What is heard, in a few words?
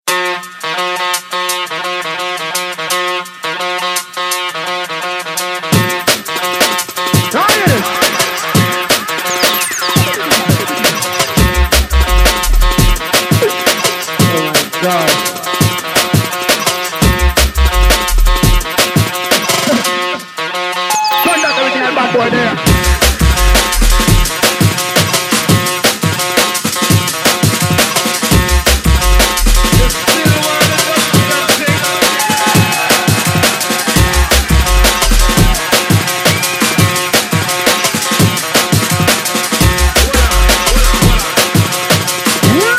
dub sample